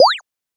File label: Birdcheep; Zero-G; Soundclash; Acidized Wavs